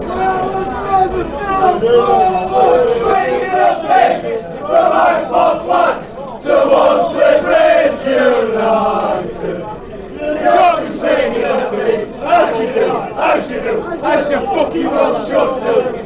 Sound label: swingin a pig, chants